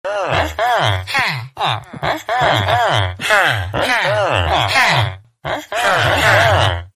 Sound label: many villagers minecraft